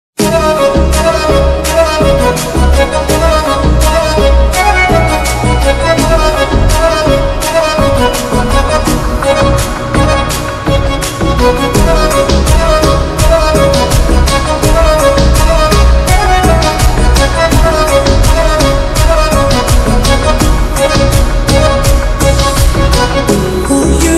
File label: lofi